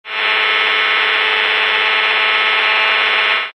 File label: DECT GSM